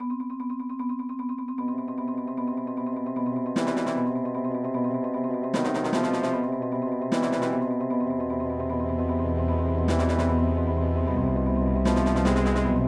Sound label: Battle; Legend of Zelda The Majora's Mask 2000 n64